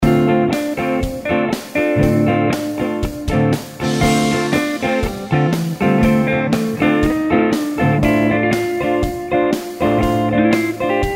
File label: Dm7 With lead, guitar